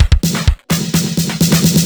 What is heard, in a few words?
Minimal Fills; Minimal Loops